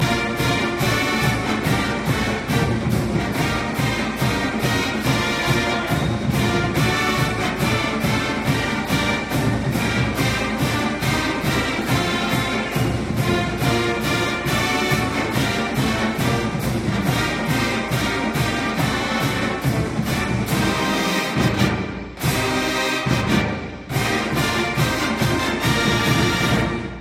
fight song

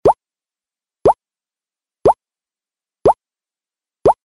5 Bloops

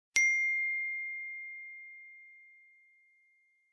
iPhone message sound

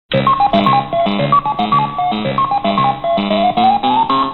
Sms; Pacman; meloboom

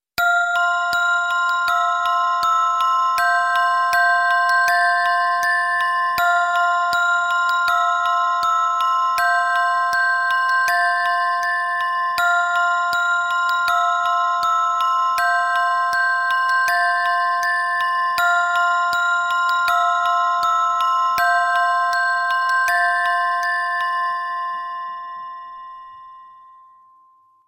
Sweet Bells

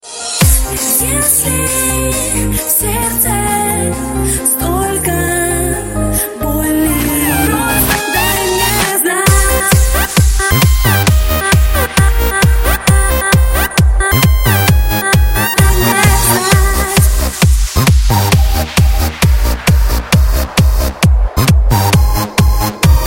Electro Mix

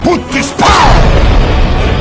Pow; Pottis

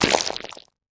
Squish

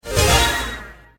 Power Up

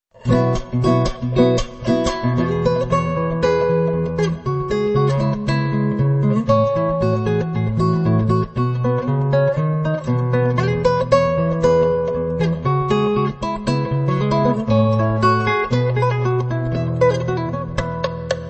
Disco Inst; Platinum

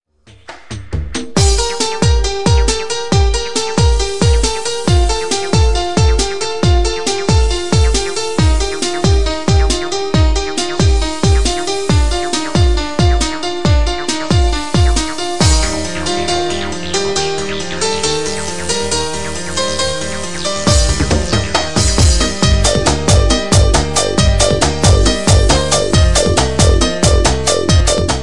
House Music

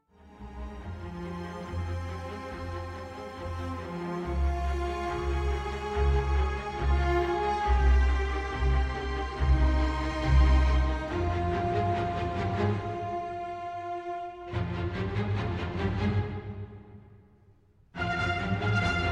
3rd Movement

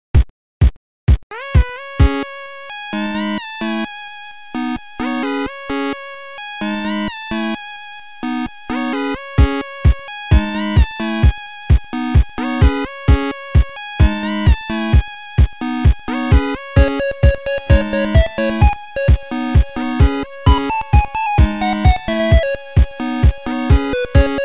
8bit version

